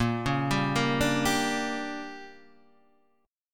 D Major 7th Suspended 4th Sharp 5th

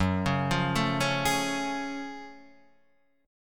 F sharp Minor Major 9th F sharp; arpeggiated

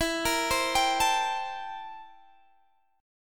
C 13th E

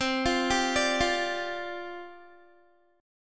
C Suspended 2nd Suspended 4th, down, Guitar

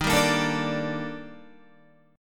Eb Major Sharp 11th, down, Guitar; EbM#11 strummed

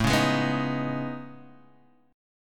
A Major Sharp 11th, Guitar, DADGAD

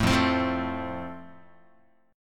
F Major, Guitar; strummed

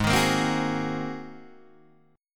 G sharp 9th, Guitar, Open D

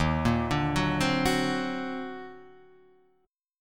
Eb Minor Major 13th, Guitar, Half Step Down

